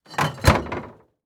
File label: Household Fridge Close Door Mini Fridge With Glass Bottles